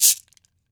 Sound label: CABASA GOLD; Brazil